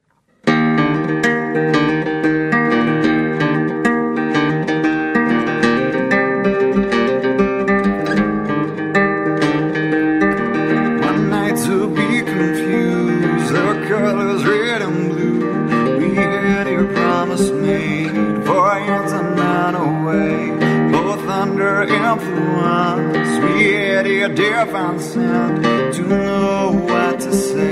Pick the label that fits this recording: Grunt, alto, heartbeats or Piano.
heartbeats